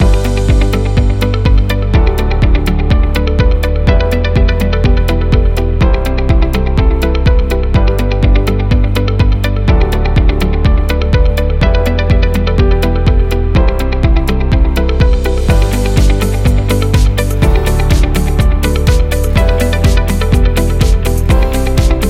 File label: Urban Reflections Corporate Loop